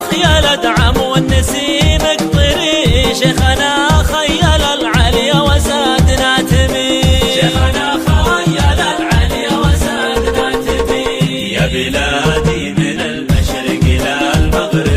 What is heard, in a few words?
qatar song ringtone